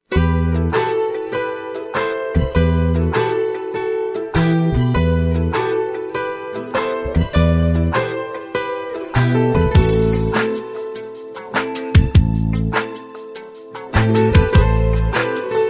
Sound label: instrumental, persian